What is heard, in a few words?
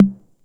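TR 606, drum kit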